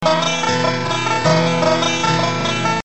Banjos Notes Rapides Slow

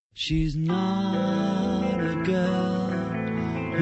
Arpege, cette succession d’arpèges en la mineur

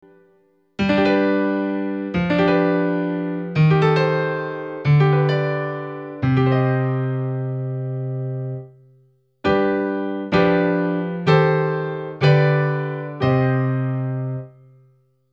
Descente

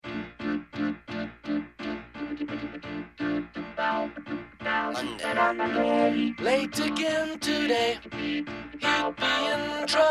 Majeur 7

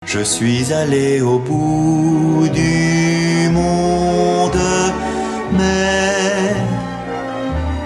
Montee Chromatique

